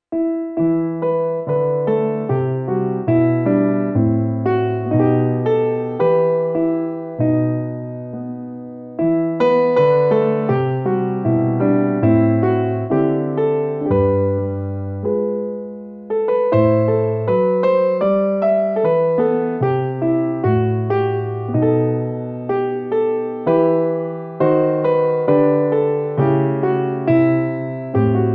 Christmas Piano Music, God Rest Ye Merry Gentleman